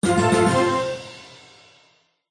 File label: Win